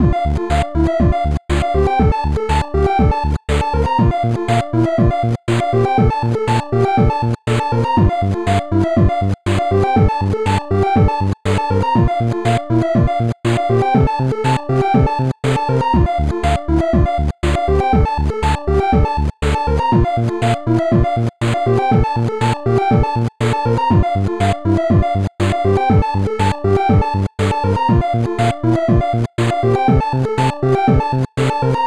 techno loop, a short loop made with Pico-8 on four channels